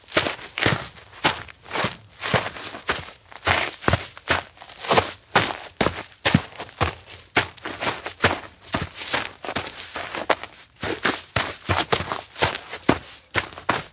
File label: steps outdoor forest run tangle; chiharu; anemoi